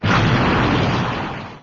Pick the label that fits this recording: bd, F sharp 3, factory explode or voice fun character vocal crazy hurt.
factory explode